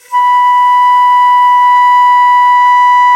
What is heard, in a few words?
venu B4, India winds; World Combos; E-MU Producer Series Vol. 7 – Old World Instruments